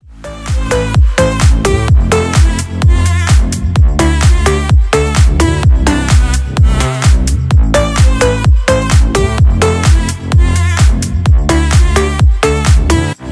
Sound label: bounce, edm